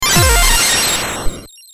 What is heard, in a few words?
checkpoint activate